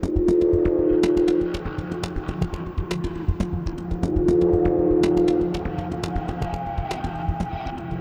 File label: Level Load Beat